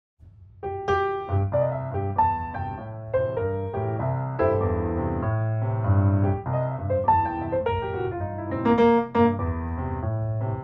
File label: blues